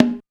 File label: conga; r100